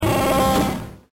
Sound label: WOOKIE